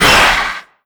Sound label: pain, antlion; creatures; vox